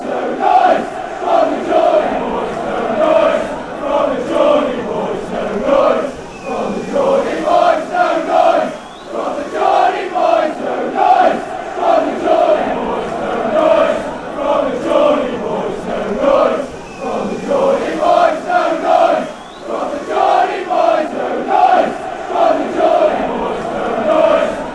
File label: no noise